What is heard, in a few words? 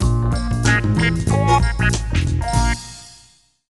70s Groove; Worms Armageddon